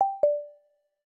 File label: Manner Cancel, LG V60 ThinQ ui